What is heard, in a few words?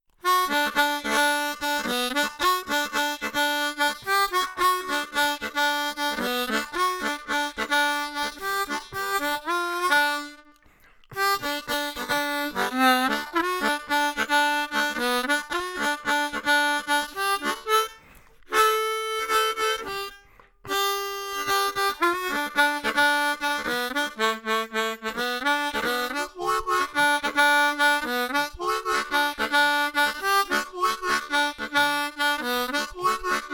grooves, blues harmonica